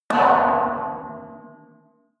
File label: metal impact bar bell large